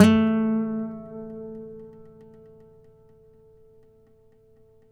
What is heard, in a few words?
FLAMENCO NYL, Guitars; Spectrasonics; Hans Zimmer Guitars VOL-2